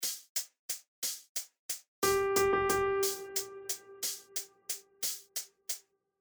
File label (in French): Croche 2 Double croches croche Ternaire